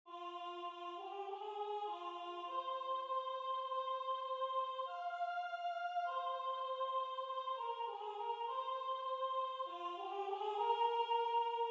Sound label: Soprano; Gevaert